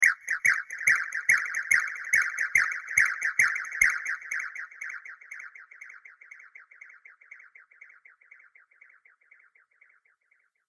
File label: Arcade Game Crazy Chirps; Video Game Sound Effects; Retro Inspired Sound Effects; Think Nintendo, Atari, C64; Andrew Fleming